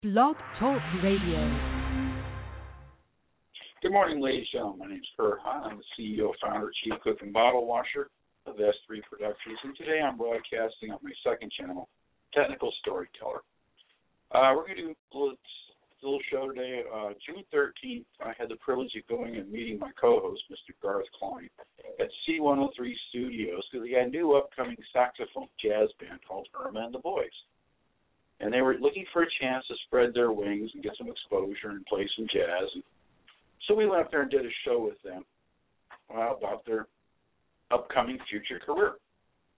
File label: saxophone jazz band